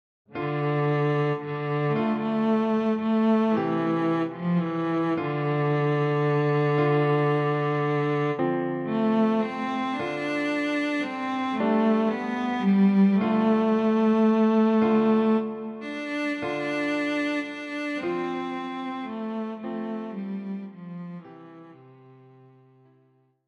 Scarborough Fair

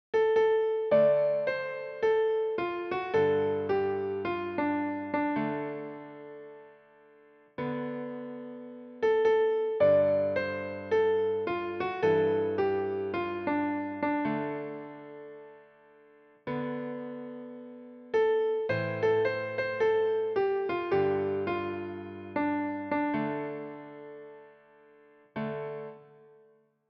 Blues

